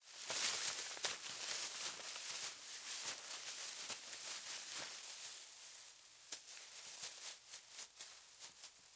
Bushes Rustling Walk Thru; Fairlight Sound Lib

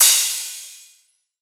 Crash; Cymbals; Ghosthack; Color Bass Essentials

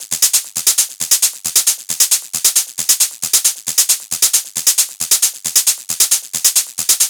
Hat Loop 135, Techno

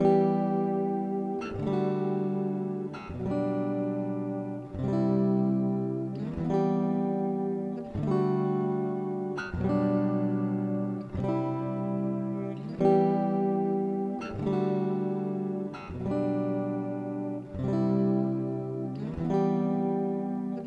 Guitar Loop - 150 BPM G Maj; Instruments; Guitars; Cymatics; Light Free Sample Pack; Bonus Samples

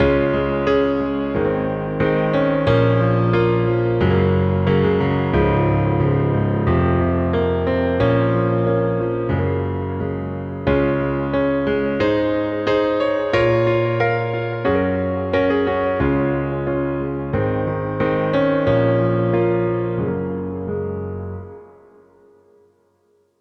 Main Piano C#, BPM 90; Twice; Ghosthack

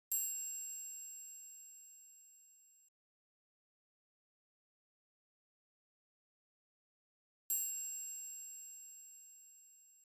Drum Loop, 130 BPM Triangle; Loop Stems; Cymatics; Vibes II Drum Loop Collection; Genie Drum Loop

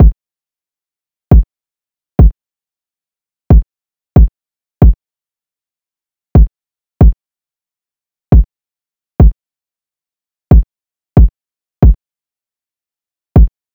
Drum Loop 137 BPM Kick; Loop Stems; Cymatics; Mystery Pack Vol 4; Cage Fight

